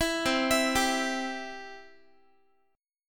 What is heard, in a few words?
C Major, Guitar; arpeggiated; 19,20,19,17,x,x